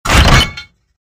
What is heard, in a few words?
fused weapon sound effect; zg survival